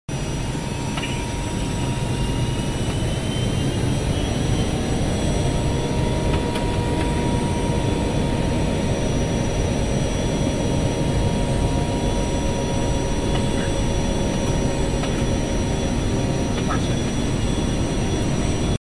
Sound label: Take-off Sounds Cockpit